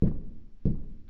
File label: knock